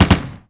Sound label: fling